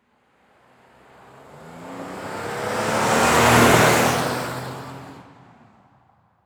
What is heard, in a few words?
Jeep Commander Drive By Fast; transportation